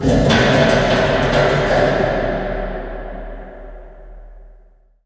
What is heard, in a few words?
metal, ambient materials